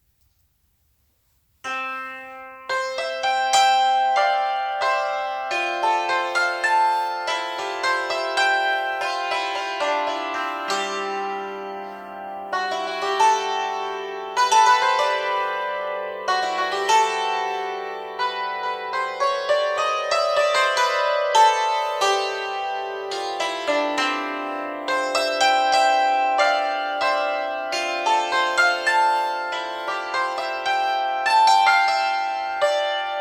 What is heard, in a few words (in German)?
Hackbrett